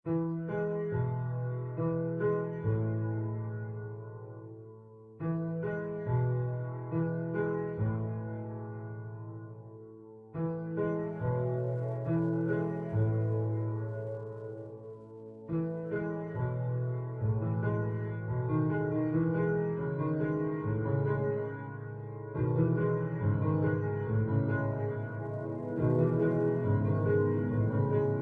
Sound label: Ambient Music